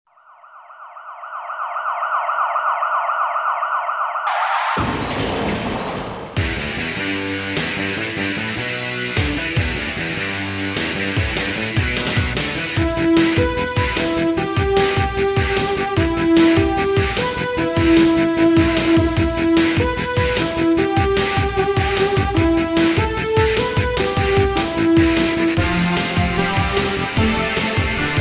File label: Guitar no Rumba; Fuu Un Mokushiroku; Savage Reign; Gordon Ending